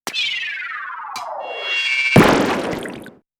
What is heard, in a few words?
SE Splat Bomb